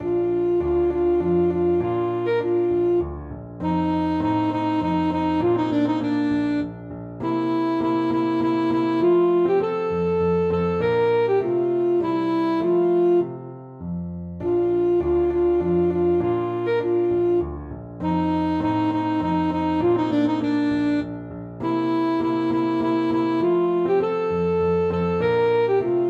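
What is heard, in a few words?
alto saxophone; minuet from don giovanni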